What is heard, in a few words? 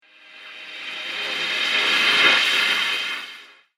Alien Synth Pad; Alien Sound Effects; science fiction sound effect